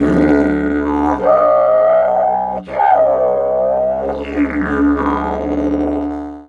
D1 WILD, Didgeridoo; Big Fish Audio - Didgeridoo and Other Primitive Instruments

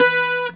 C GTR HARD B3, Celtic Flavours; USB Soundscan vol.42; AKAI

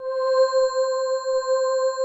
SWEEPER3 C6; Greytsounds; Scott Peers Sound Engineering

